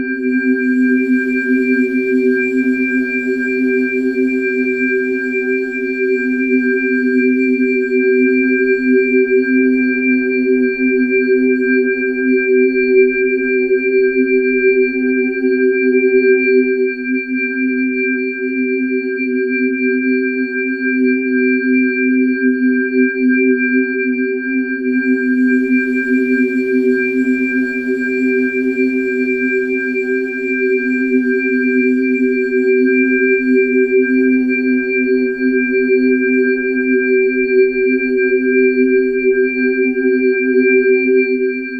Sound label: ambient; openspace3d; vrcells